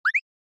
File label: Anime Motion Short High